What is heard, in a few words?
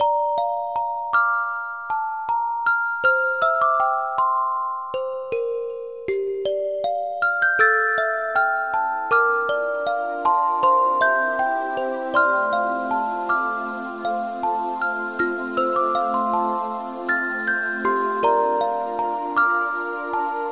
orgol ver.